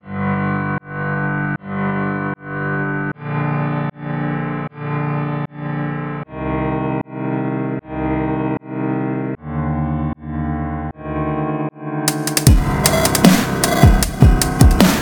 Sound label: phonk